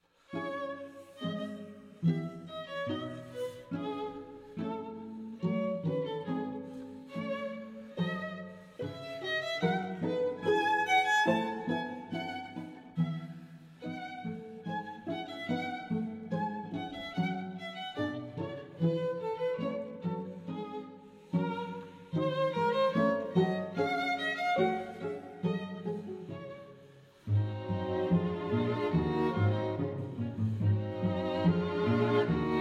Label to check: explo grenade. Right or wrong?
Wrong